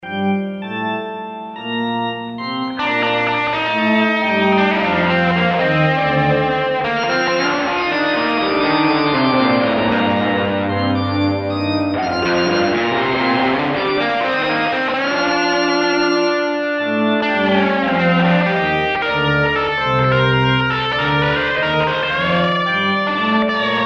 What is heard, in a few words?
jazz